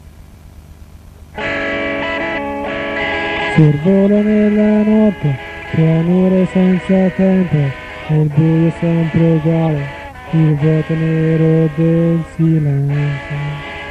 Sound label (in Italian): Un'alba nuova (solo chitarra elettrica)